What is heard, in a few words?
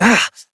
Pain Short Operator Voice; New War